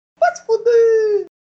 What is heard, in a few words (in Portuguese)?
cuíca